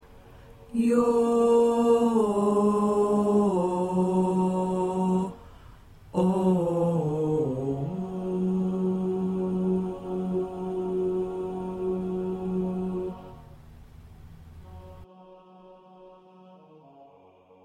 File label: Tenor, choir parts